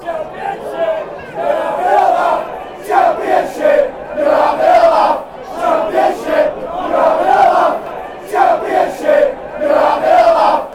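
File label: championship youre having laugh fleetwood, football songs and soccer chants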